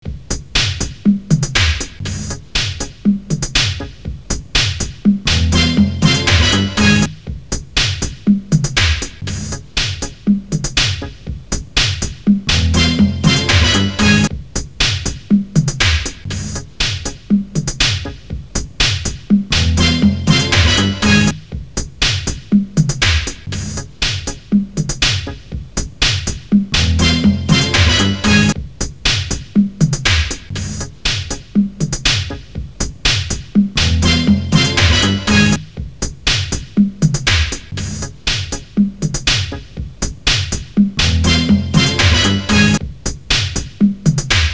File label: riff boombox